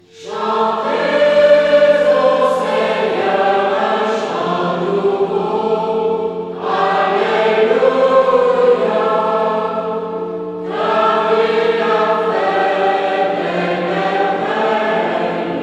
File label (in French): Chantez au Seigneur un chant nouveau, Psaume; Ps. 097; Imm. Conception; Marial; Immaculée Conception; J. Gelineau